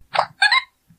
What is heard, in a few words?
Wiimote Sound; Diddy Kong